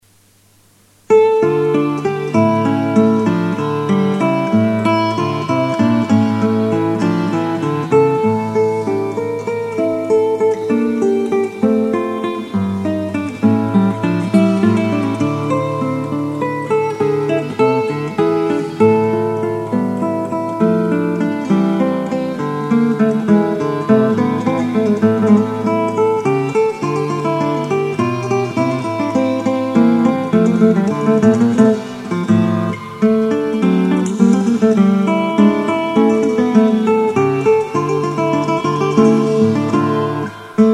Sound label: murcia allegro, guitar